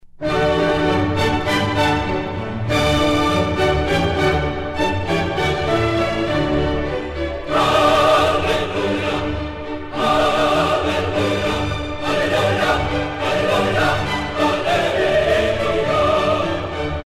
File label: Hallelujah Chorus